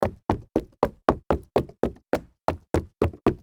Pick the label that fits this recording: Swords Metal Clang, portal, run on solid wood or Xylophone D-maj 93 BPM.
run on solid wood